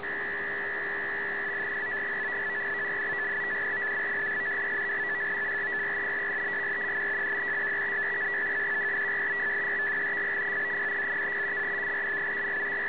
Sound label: 1 x FSK 150, Israeli Navy VFT system; VFT aggregate running 150 Bd FSK only (recorded in USB!)